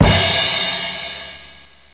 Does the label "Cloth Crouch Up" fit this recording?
No